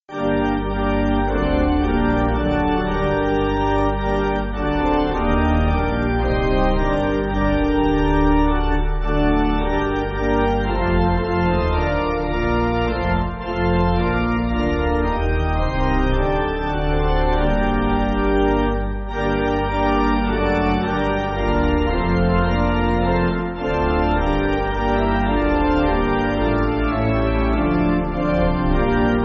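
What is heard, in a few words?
Pipe Organ, Backing Files for Churches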